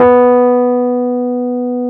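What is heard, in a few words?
E PNO B2; Synclavier Sampler Library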